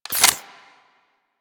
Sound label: Reload, Weapons; Strun Prime